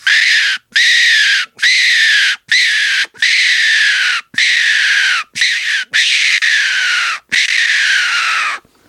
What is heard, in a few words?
T2 Yellow, 3D printed fox caller; TENTERFIELD